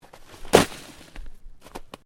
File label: Body Movement Snow Lift; Body movement foley to simulate falls and deaths; Bodies (Sound Effects:Human)